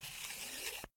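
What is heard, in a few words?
Door Pneumatic Down; OneShot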